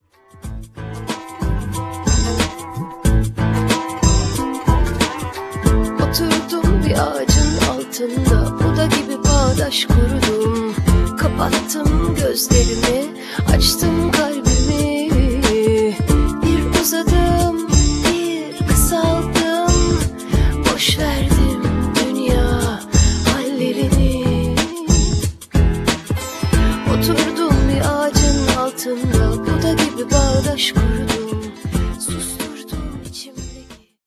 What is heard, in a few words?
turkish